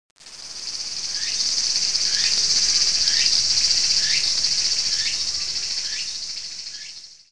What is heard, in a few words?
Cicada